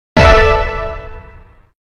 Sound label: confirm sfx; dragon ball z budokai tenkaichi 2